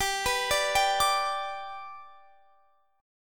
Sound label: G Major down Guitar Standard; G arpeggiated; JGuitar